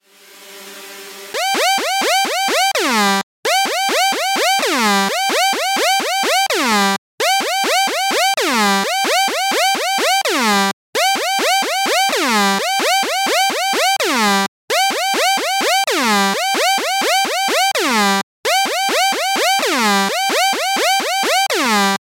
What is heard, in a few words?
ringer